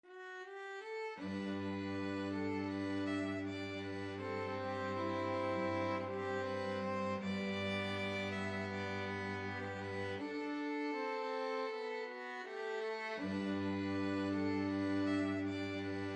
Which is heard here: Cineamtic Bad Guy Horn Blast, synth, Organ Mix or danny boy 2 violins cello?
danny boy 2 violins cello